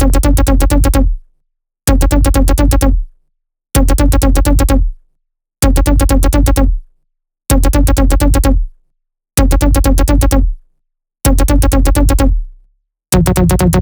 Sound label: 128BPM Melody; Vengeance Samples; Magnify Kit